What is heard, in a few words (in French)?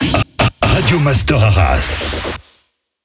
Sweeper MEDIUM; Radio Master Arras; Habillage